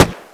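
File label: machine gun fire; WAR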